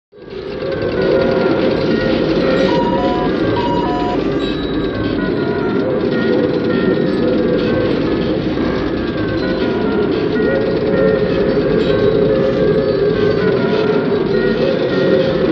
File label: Computer Room Main Co